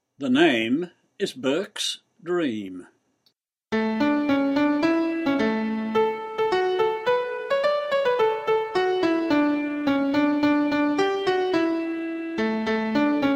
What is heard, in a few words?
D 108 bpm